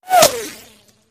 Bullet Impacts With Whir In, Impact And Debris

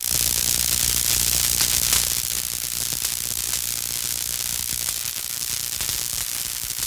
Bug Zapper Killing B; buzz & hums

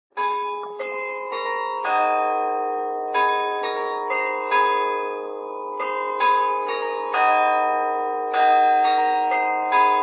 Tower Clock Chimes, bell towers exterior

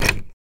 Door Knob Grab; door knobs

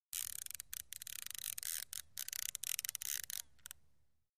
Fishing Reeling Sho

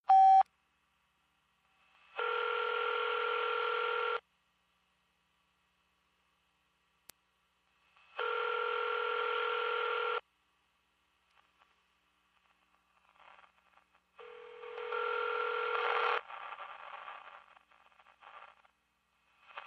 Car Phone, car & cell phones futz rings; Car phone, send, in-line ringing, some with crackle

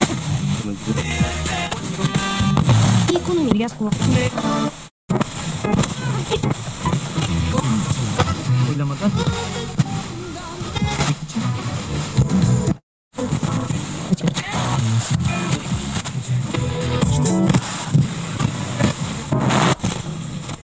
Radio Tuner Fm Tuning, static

